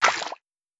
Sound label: fish flapping